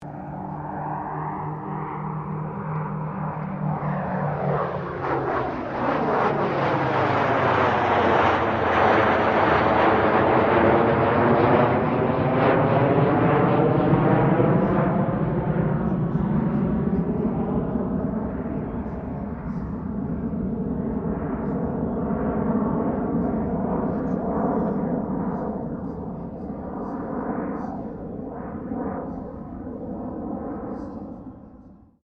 AIRCRAFT GLOSTER METEOR F MK8 PASS, Gloster Meteor fighter jet; Airplanes; Vehicles